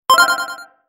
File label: positive sound